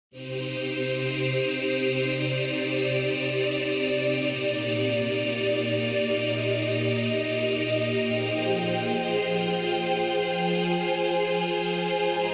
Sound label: Vocal Synths; Analog Atmospheres; pilgrimsounds